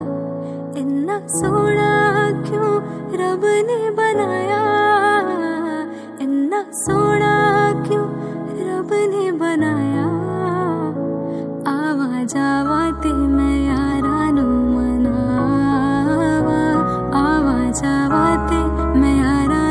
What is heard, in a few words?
Hindi Ringtone